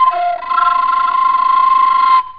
Flute fx F; SpecOps2MPlayerDemo; Music

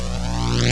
shield; MacAttack 1.92

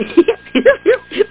missed

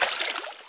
Swim Backward; Player; Heretic II Demo

